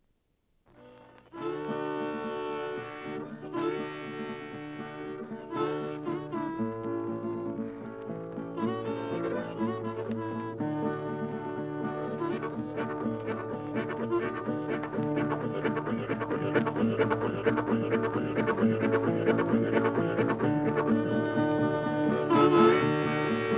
Lost Train Blues; usa

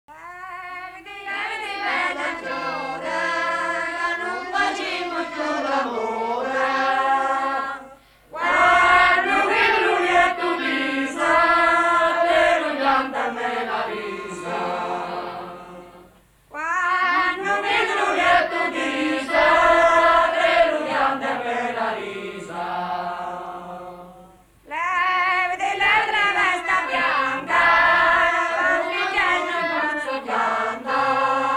Men singing in Montecalvo - Wedding Serenade

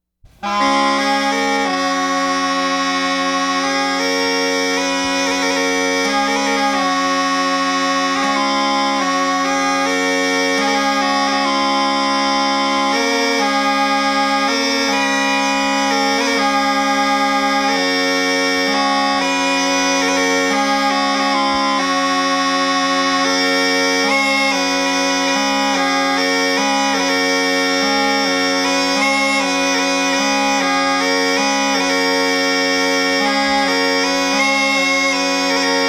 La Pastorale, Bagpipes

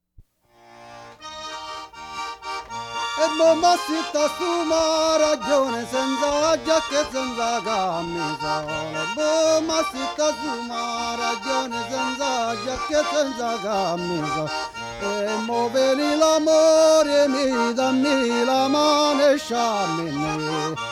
Mattinata, Vocal with Accordion; Allegria

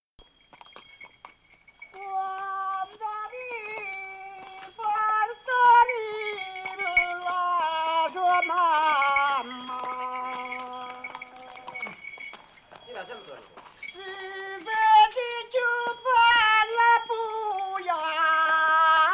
Mulateer's Song; campania

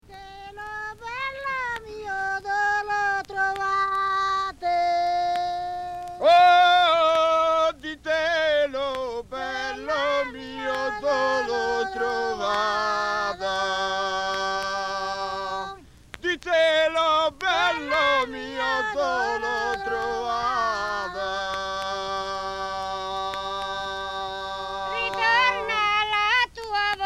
field song; canto a vatoccu

